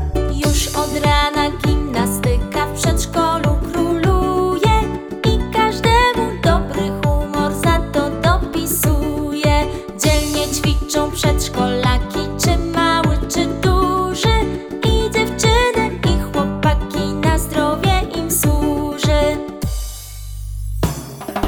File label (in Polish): utwór w dwóch wersjach wokalnych